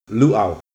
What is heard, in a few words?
luau